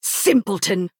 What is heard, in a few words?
attack; uupse fyr